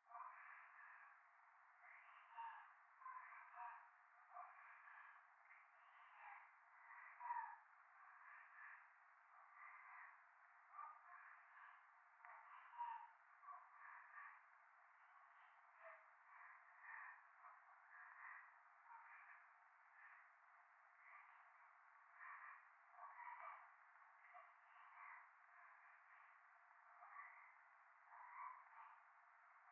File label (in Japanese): 右耳 密着 処女 喘ぎ 雨; 夢の中